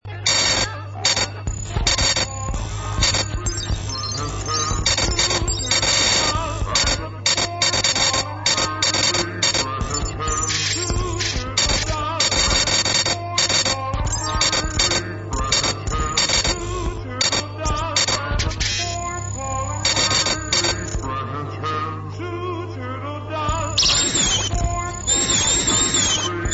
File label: Surface Noise